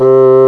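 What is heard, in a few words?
woodwind C1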